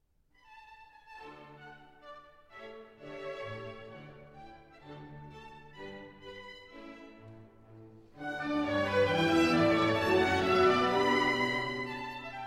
Concerto No. 4 in D Major for Violin and Orchestra